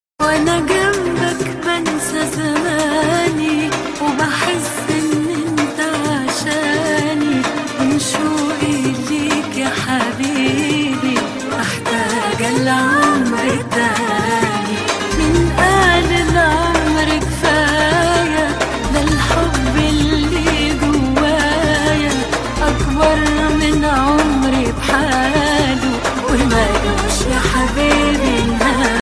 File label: arabic song